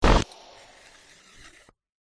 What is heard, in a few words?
tek science fiction machine burst slider movement; Audio Mangler